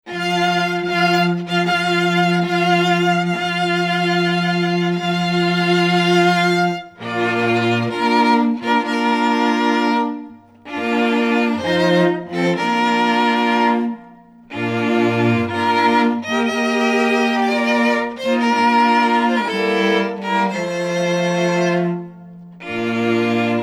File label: BRIDAL CHORUS, string quartet